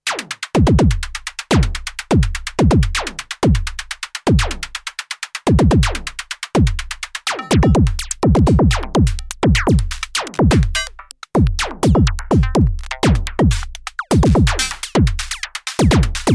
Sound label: Trinity Cycle; Modbap Modular